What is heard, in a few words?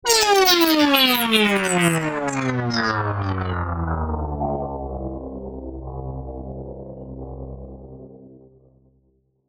Synthetic Swell